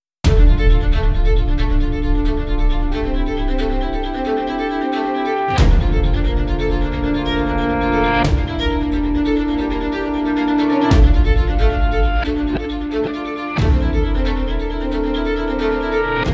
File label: epic cinematic trailer with string quartet; audioblocks